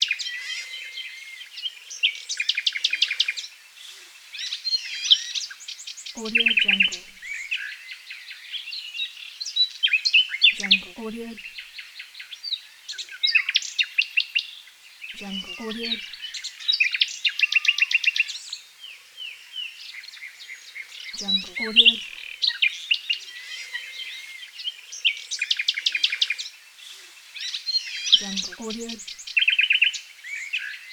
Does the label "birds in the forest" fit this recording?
Yes